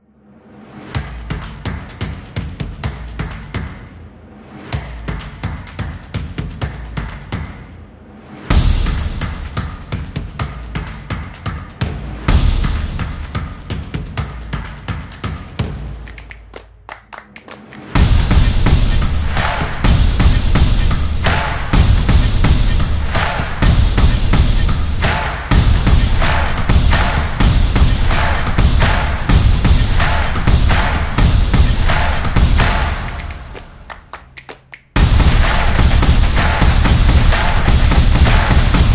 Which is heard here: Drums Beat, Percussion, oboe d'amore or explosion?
Drums Beat